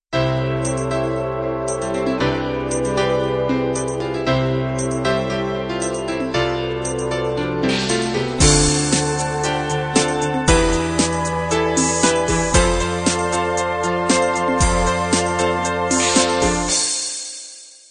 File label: 8 beat analog 3 intro; 8 Beat Analog 3 X & 8 Beat Analog 3 Y compatible MIDI file Intros and Endings